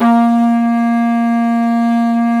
BF2 HORN L, Didgeridoo and Other Primitive Instruments; Big Fish Audio; DIDGEHORN1